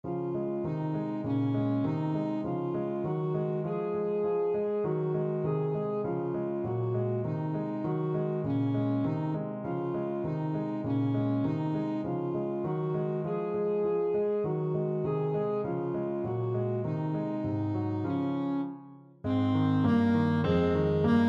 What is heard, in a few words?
schumann humming song, saxophone